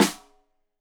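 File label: Snare Stereo, Drums; Deeply Sampled Drums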